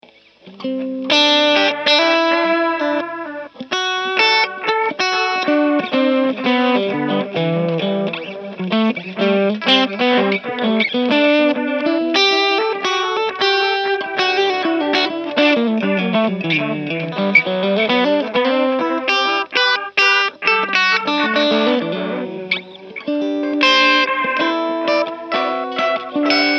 zerre

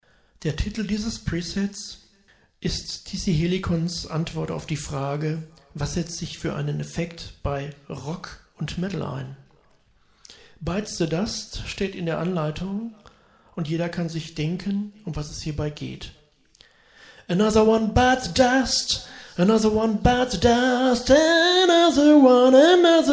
Rockmusik

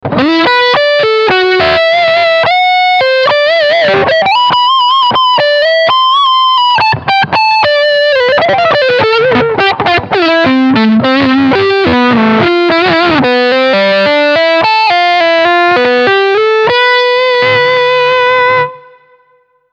Lick Front HB und SC

